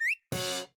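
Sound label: Cartoon Lock, phone lock sound; Pantech; Discover P9090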